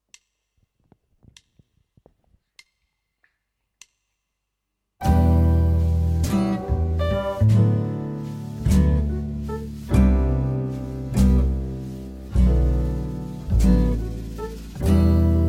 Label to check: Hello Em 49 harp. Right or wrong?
Right